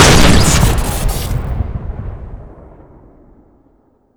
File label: shark shoot crit; tf2classic